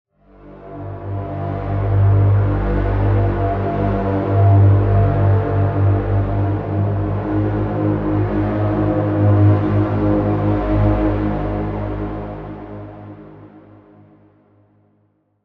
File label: Pad; Routed Sound; Spire Expansion - Quantum Enforcer Vol.1